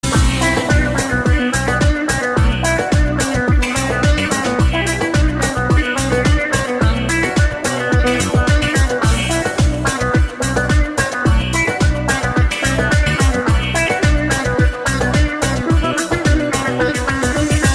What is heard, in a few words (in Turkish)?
trabzon kolbastisi